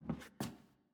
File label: Footstep Wood Rotate